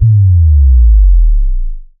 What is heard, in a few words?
SubDrop Basic Steady